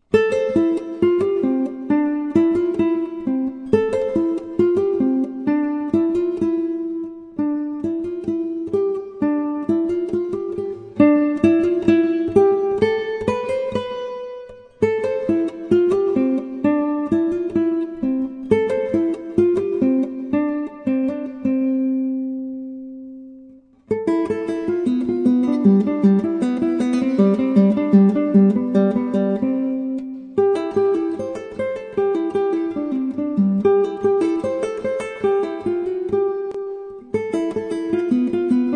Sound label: Legato, Gitaarboek; Legato 1 en 2